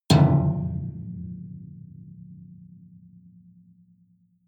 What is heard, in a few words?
metal impact piano string damped hard